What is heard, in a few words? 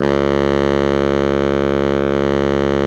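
baritone mezzo forte C#1, Sax; BARITONE MF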